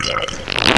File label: burp